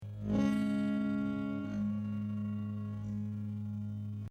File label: acoustic, edge delay